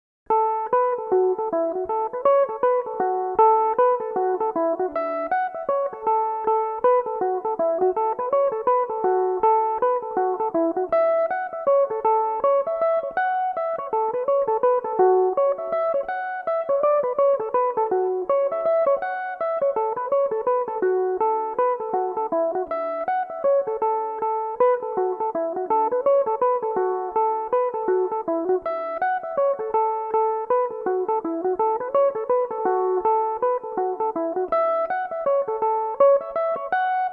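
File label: reel, A major, mandolin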